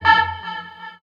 VFX, VOX ALIEN; Best Service ProSamples vol.49 - FX-Area; AKAI

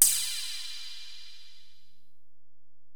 Ride, Alesis DM-PRO, Drum Machines

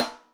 Ana Me Rim, Electric Snare, Yamaha DTXpress

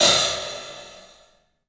club hat; 3D Sound; 3D Drums

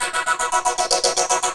Riff Trip Gb; Digital Sound Factory Xtreme Lead

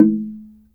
SFT SLIT DR, Heart of Africa; SLIT DRM2

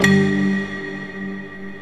STEEL DRUMS

